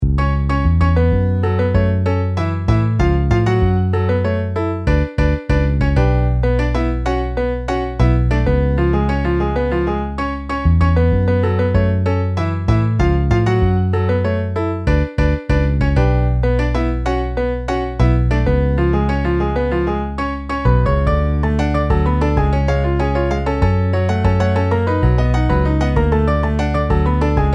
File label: Piano Bass; IRE PRODUCTIONS